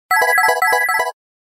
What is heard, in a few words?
Bell; Samsung SCH-R450 Messager